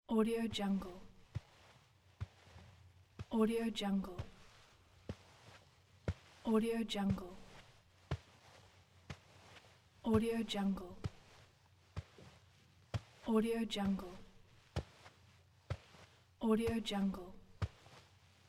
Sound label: sneakers on carpet footsteps